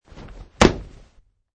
Landing Wood

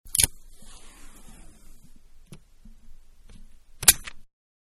Tape Short Dispensing; Tape (Sound Effects:Offices); Manhattan Production Music

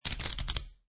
Poker Chips Drop Stack Of Chips On Stack Of Chips; Gambling

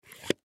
Air Pump Pull Lever Up; Air Pumps; Machines; Blastwave; Foley

